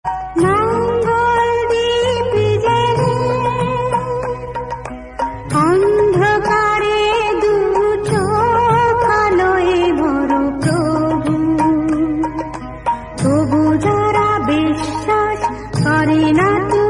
Bengali Bhakti Song

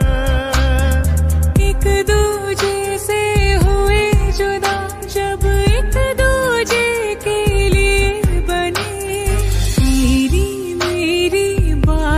Female Ringtone

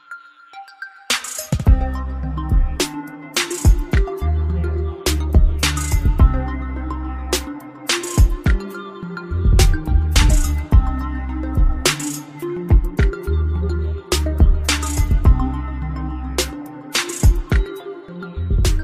Music Ringtone

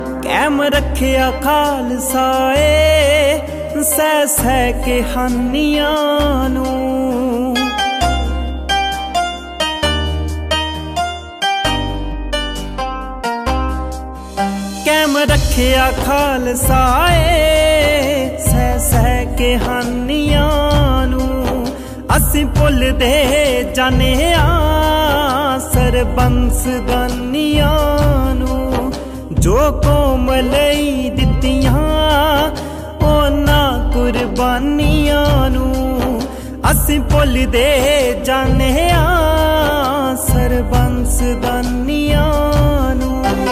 Punjabi Song

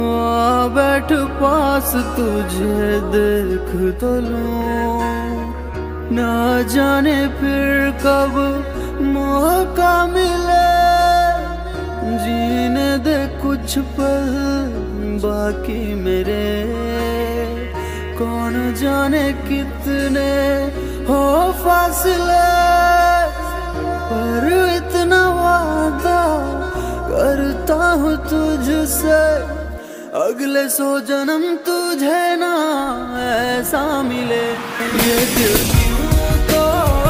Very Heart Touching Sad Song Ringtone

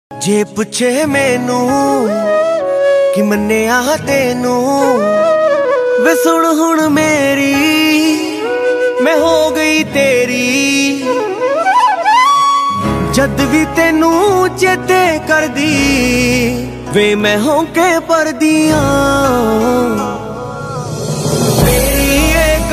New Punjabi Ringtone

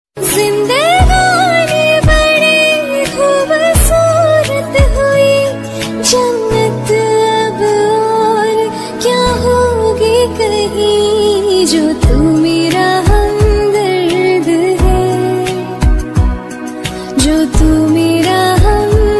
Female Version Ringtone

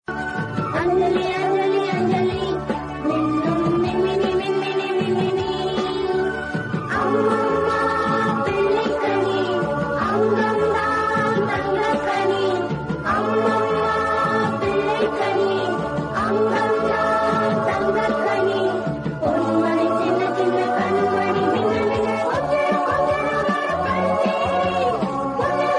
Tamil Movie Song Ringtone

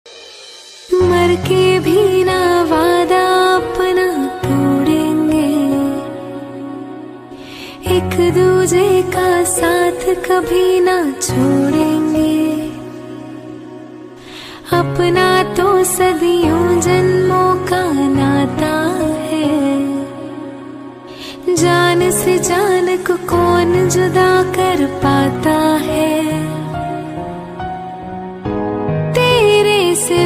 Most Heart Touching Female Version Sad Ringtone

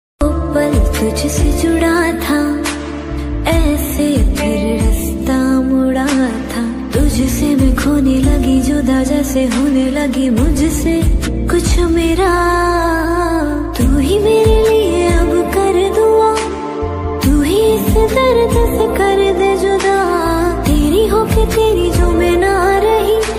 Female Version Ringtone

